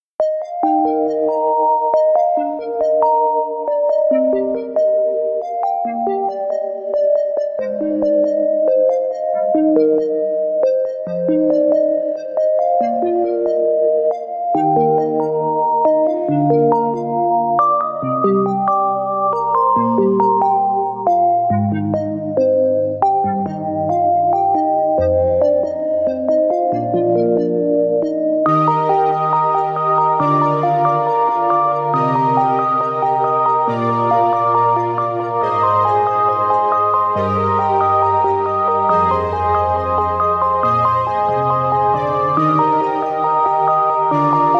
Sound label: Lullaby, background peaceful